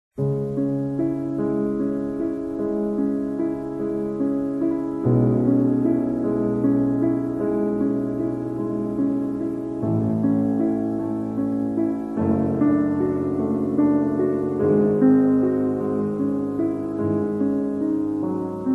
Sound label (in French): Sonate; Clair de lune; 1er mouvement; Beethoven